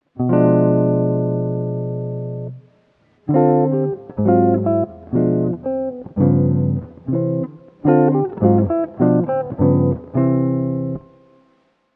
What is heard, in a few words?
S Guitar